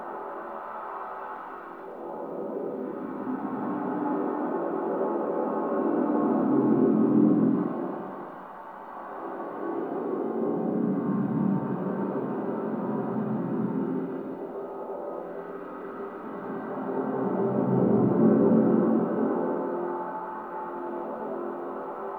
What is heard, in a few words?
Large Room Middle Amb; Warframe; RailJack; Ambience